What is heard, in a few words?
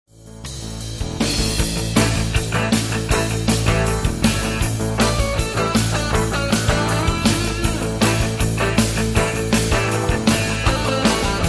music